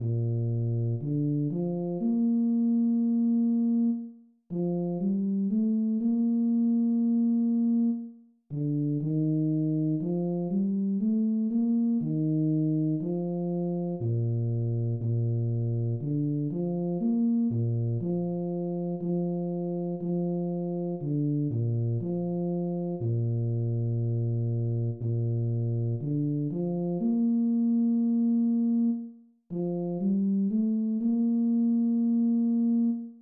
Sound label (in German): bass